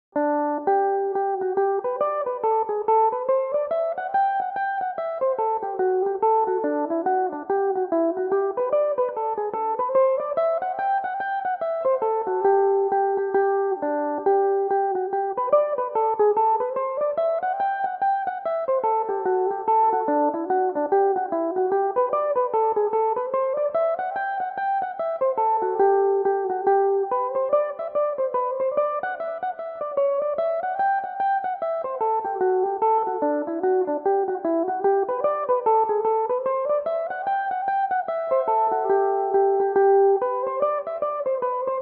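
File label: hornpipe, G major, mandolin; cooneys hornpipe; belmuse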